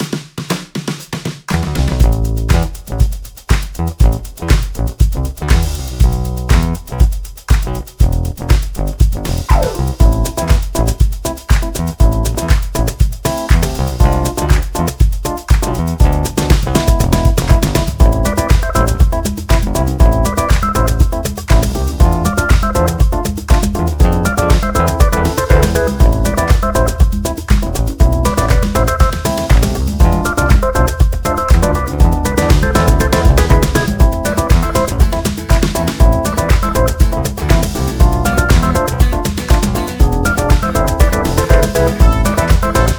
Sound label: funky jam